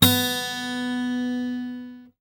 GUI Ac., GUIT AC; Best Service Gigapack 2

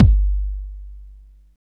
KICK, Tekno Industrial; East West

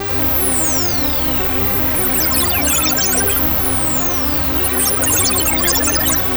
S+H SCIENCE, ARPEGGIO 150; Masterbits - Tekkno Trax

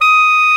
SOP SAX D#6; Greytsounds VOL-1

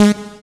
SYN Poly G# 3, SYN JD; Best Service Gigapack 1

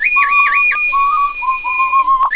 PYGMY LOOP, Ethnic; Zero G - Ethnic

